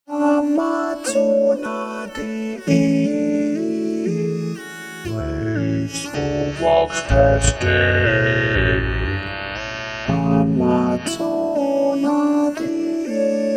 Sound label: Waves Ovox